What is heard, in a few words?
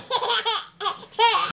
laugh